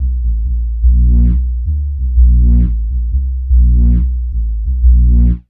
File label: Gwaan Bass, Drum & Bass; Fluid Dynamics